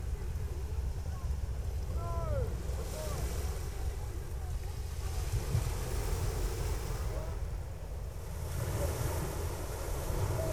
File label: Brighton beach